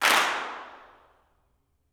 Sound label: CLAPS, Gospel; Spectrasonics; Vocal Planet Vol.1 Gospel; akai